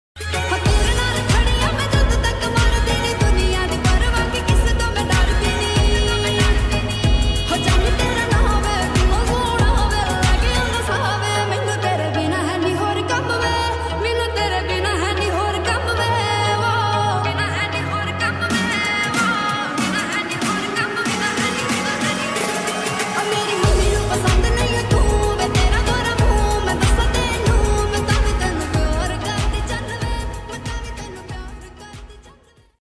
new punjabi ringtone new punjabi love ringtone new punjabi sad song ringtone